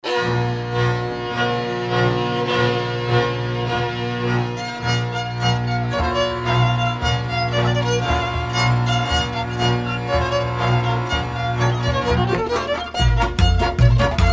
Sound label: Romanian Wind; Sherlock Holmes A Game of Shadows